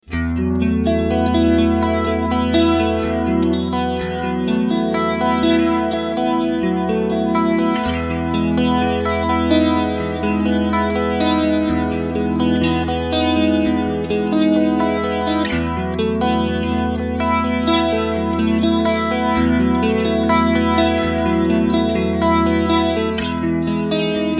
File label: Singlecoil clean